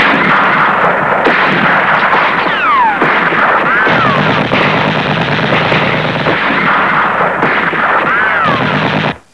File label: SHOOT, 射击; 特酷声音素材库